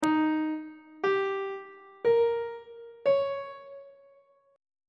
a flat melodic minor 7th chord 5 is e flat dominant 7th on treble clef